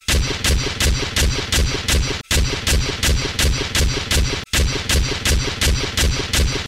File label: sentry shooting